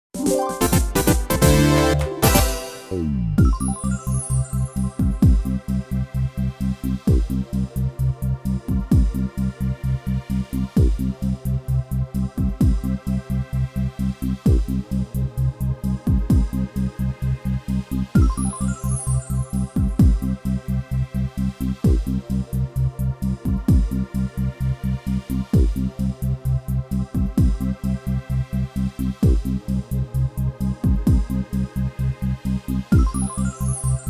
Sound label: Jingle Stage Clear!; Real Escape Game Nintendo 3DS; Escape from the Plan of Mega Destruction